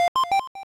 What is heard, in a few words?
Item Get No Voice; openbound